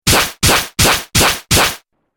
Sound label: Laser Zap, Laser Gun